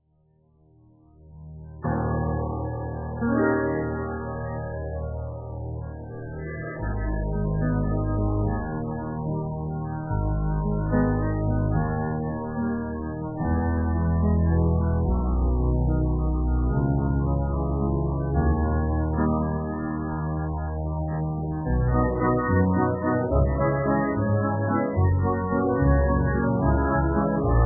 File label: Silly Villain